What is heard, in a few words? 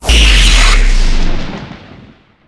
gauss fire